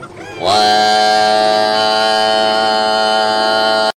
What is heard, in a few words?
lawn mower